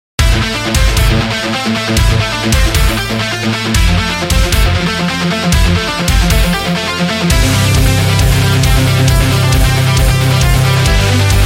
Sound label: A Metallic, metal